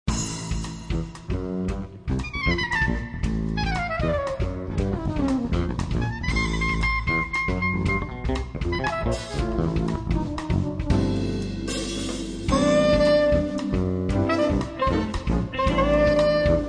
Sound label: quintet